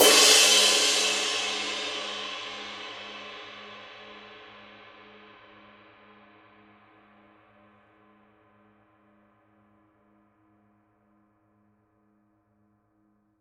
22 dark crisp ride edge; paiste; masters

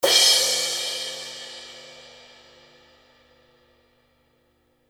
2002 black 20 big beat edge, paiste

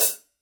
sound edge hi-hat closed; paiste 2002

